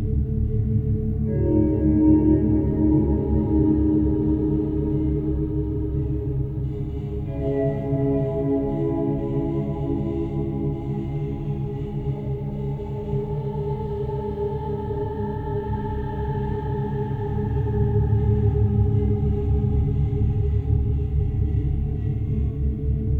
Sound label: Explore Mystery